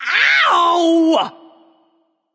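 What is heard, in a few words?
ouch, female; smite; voicepack; hera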